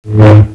lightsaber miss

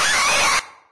squeal, stalker; scmod

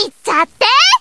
long jump; kc shimakaze 22

